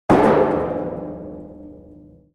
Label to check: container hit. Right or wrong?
Right